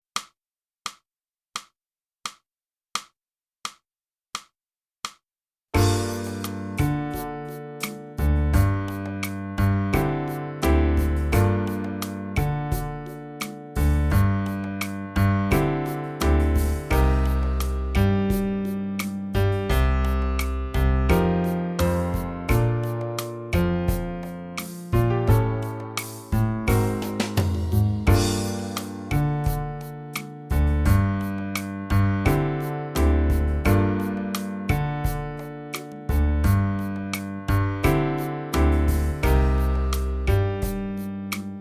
flute, golden sand

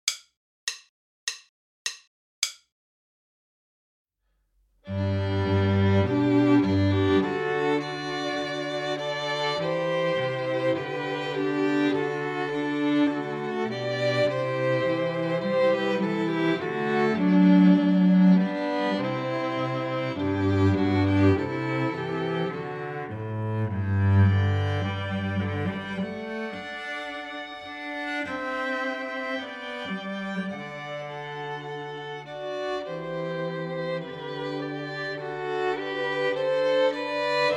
minus Viola

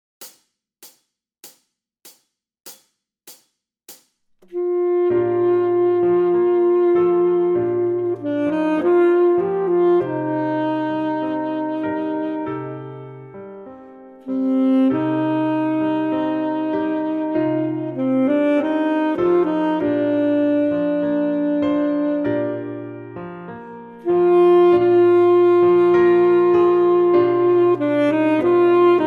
Full Sax

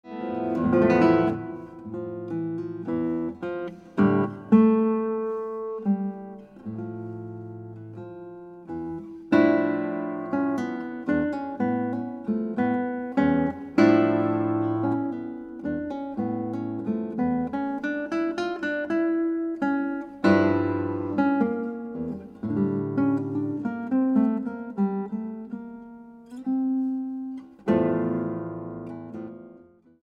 Guitar

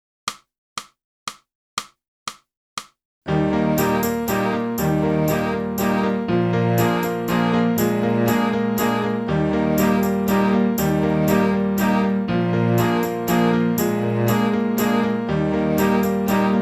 sax

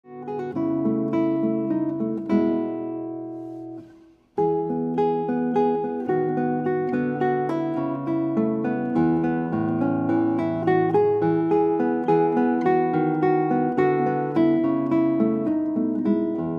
Guitar

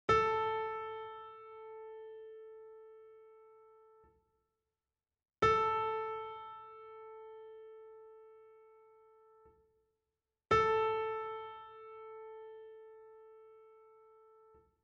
Tuning Note Flute

